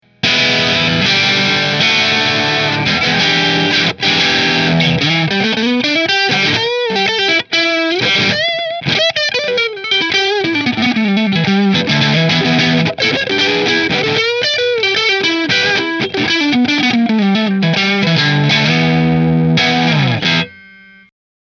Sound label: Nola JB14 Driftwood Neck Marshall